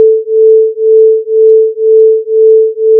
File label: Beats 440Hz 442Hz, beat frequency 2Hz; Schwebung mit Schwebungsfrequenz von \(2\; \mathrm{Hz}\); als Überlagerung zweier Schwingung mit \(440\; und \(442\